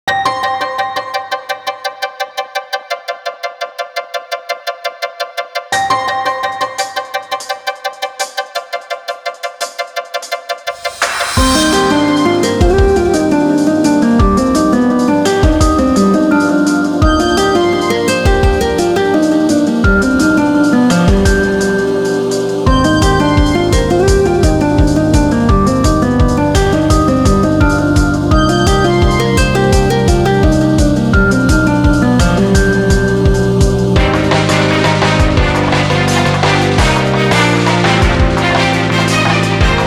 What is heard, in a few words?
Ambience D&B